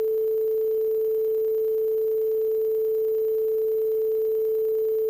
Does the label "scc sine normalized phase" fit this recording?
Yes